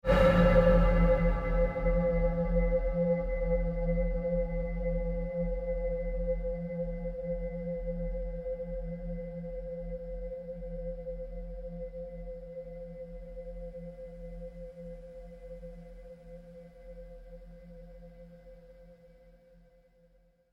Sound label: pulse fade long, Sound effects created by manipulating other sounds; Rise And Dive Sound Effects